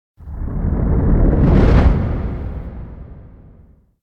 Whoosh Heavy Movement Deep And Slow Distorted Whoosh; Hollywood Edge; sound designer's toolkit v2